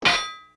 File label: Arrow Bounce Metal; An arrow bouncing off metal (2 pitches) (Recorded)